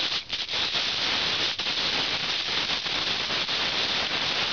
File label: pearl gatling cabasa